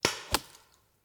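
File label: Paintball Gun Impact; Sound Ideas; Guns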